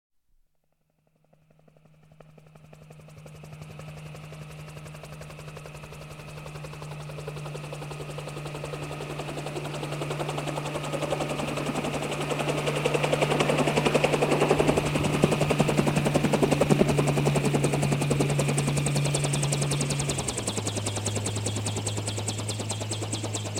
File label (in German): landender Hubschrauber; Suedsee